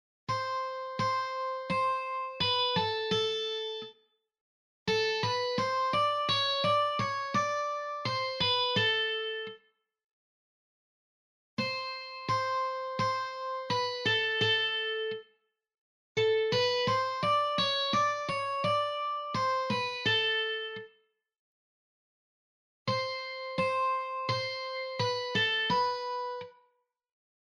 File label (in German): Lead Gitarre